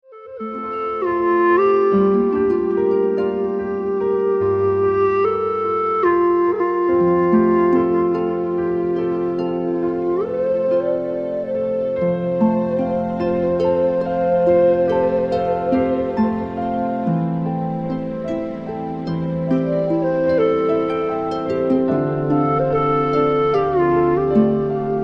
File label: Chillout, HEALING MUSIC